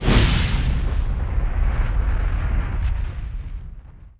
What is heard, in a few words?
firestorm collide